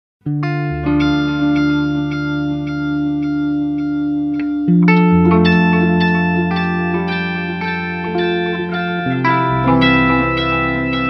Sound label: Polyphony; Eventide H90